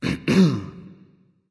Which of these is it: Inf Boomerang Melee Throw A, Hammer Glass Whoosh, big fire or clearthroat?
clearthroat